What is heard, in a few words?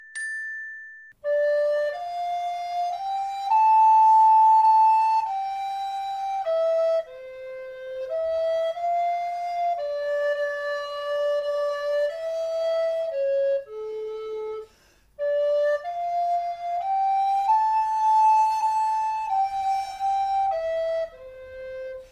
Greensleeves melodie